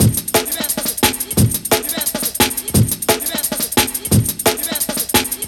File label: 175, classic dnb breaks